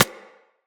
Bullet Impact Generic

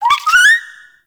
Rabbit Ambient Call; Warframe; Duviri

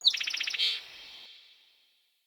Sparrow Summon Chirp UI; Animals; Vocalizations

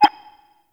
Interior Orbital Station Console Rollover; Warframe; Tau

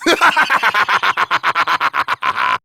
Laugh; Warframe; Tyl Regor Boss; Efforts

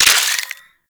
Assassinate Pickup; Inf Eris; Warframe; Pickups

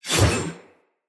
Player Short Sword Heavy Whoosh; Weapons; Swords

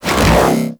Hoverboard Ground Spin A; Vehicles; Warframe; Venus Hoverboard

